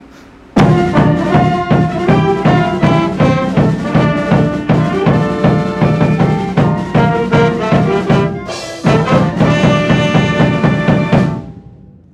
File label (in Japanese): ファンファーレ